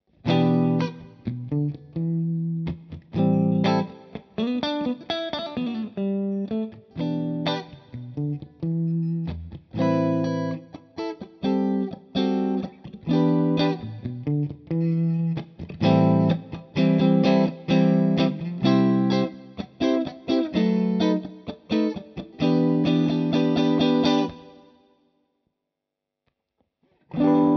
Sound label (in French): comparaison clean tumnus vol reequilibre